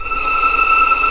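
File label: E6 tremolo p violin right, violins tremolo; East West; The Ultimate String Collection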